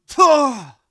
minor pain, soviet

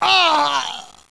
death; jihadist

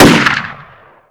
unsil; weapons; fear

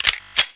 m24 bolt back; weapons

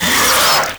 warrior idle

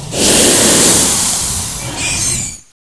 alien hiss

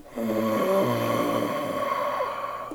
zombie groan

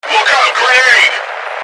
fear grenade

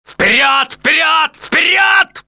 attack

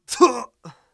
minor pain, soviet

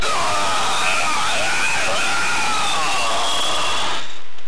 Fire Scream; usmc

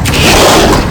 bloodsucker attack

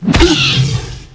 raptor attack

